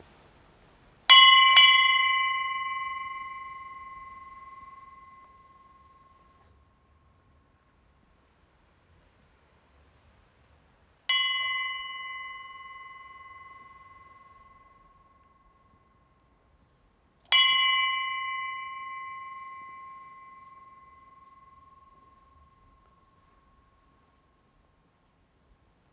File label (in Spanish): CAMPANA de ESTANCIA INT; La Gloria UY